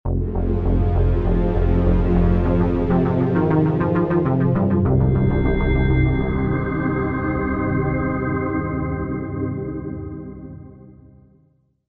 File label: deathcam